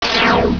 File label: laser